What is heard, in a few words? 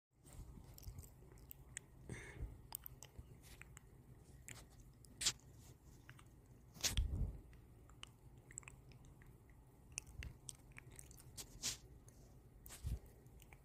wet sounds